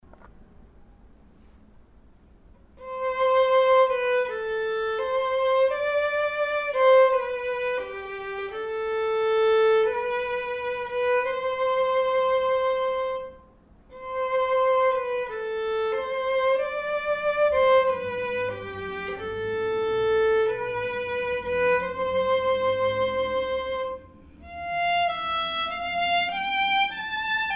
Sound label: perf vln